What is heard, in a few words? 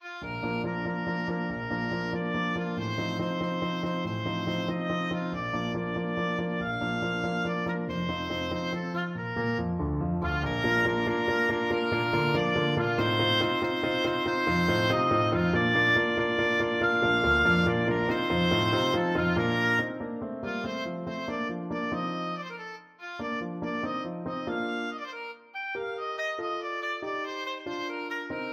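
Mozart Piano Concerto No22 Third Movement, oboe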